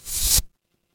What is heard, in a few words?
paper fabric fabric